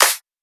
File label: clap snare; Anthemic